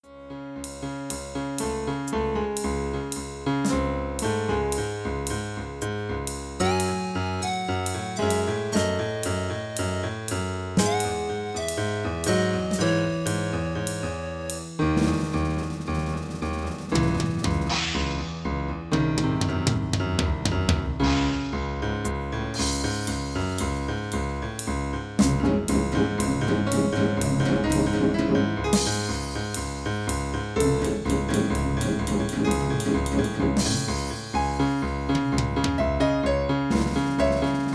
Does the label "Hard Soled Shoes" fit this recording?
No